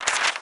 page turn

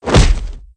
hit up; rocco

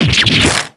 damage

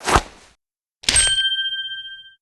foot pose

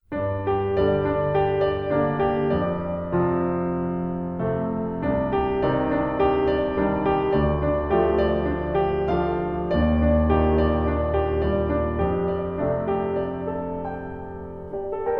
piano

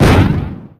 appear

